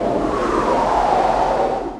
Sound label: wind walk; Nepilim; Seer; GamigoNA